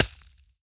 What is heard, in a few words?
dirt hit; teardown; ps5 windows xbox series xs